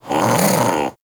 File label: Armadillo Vocal Alerted; Warframe; Characters; Hunting Critters